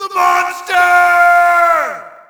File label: Shout, audience; UFC Tapout 2